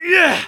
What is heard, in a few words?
flip exert; Medic; taunt